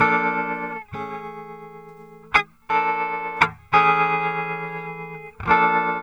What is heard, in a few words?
GUITAR, Funky Ass Loops; East West